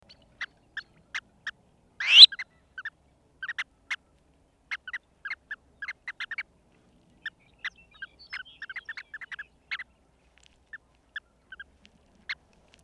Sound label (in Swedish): Brednäsa